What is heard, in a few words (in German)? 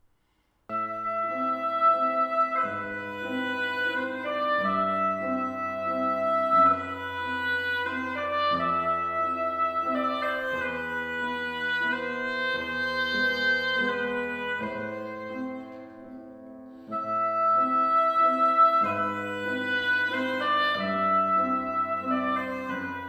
oboe klavier